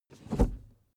suv pull back gear straight; verkehr transport; autos

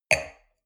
wine glass place table soft short; soundpool

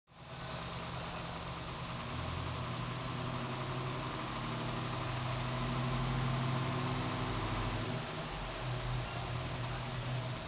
car camaro accelerate decelerate engine

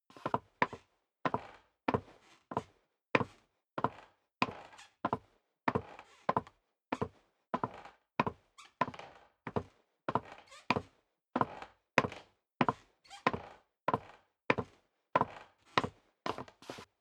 step sneaker wood creak downstairs sequence; schritte

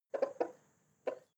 hens clucking short; natur-tiere; huehner

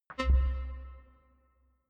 click tonal reverb low

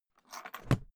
briefcase plastic close metallic rattle; industrie wirtschaft; bueroarbeit

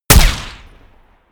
gun shot silencer designed ricochet

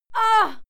female fight scream pain; schreie; menschen

